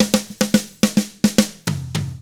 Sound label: POP ROCK Drums; 109POP ROCK